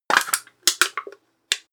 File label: soda can crush